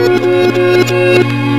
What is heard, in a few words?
HIT, Hits & Brass